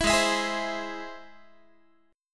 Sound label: G sharp Augmented, Guitar; strummed; 19,16,19,18,16,x; 4A,4E,5A,5D,5G#,6C#